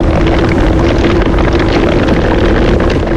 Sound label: Spinjitsu Earth Tornado Loop